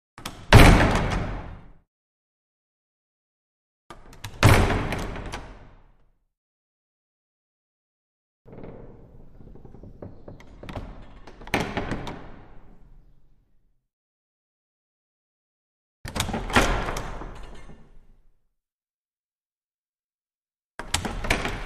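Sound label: Small Wooden Chapel Door, crash slams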